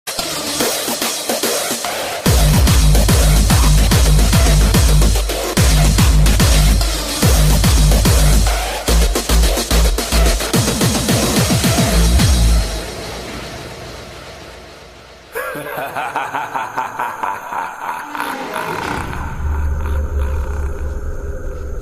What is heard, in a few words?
Scary Hardstyle Ringtone